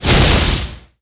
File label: New Freezing Distunce Flying